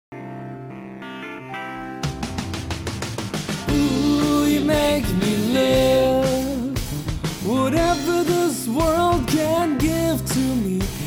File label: With Instrumental